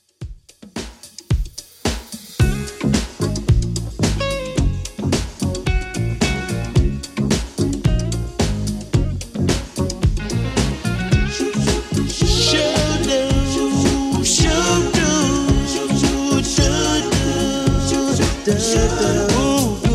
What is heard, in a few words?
70s Redrum 110 bpm CLEAN